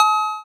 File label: cowbell, drift phonk